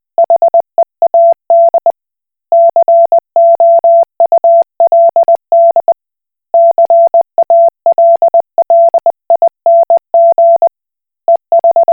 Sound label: Koch Morse